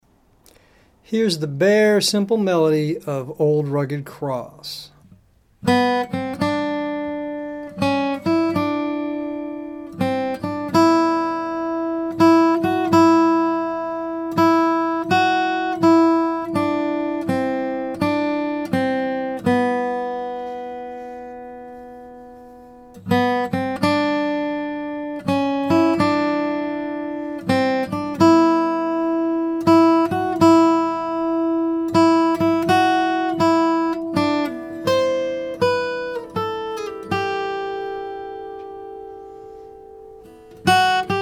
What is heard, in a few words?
Old Rugged Cross Melody